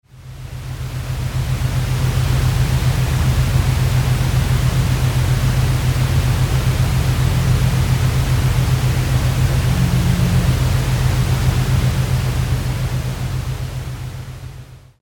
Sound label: Monitor Speaker Burn In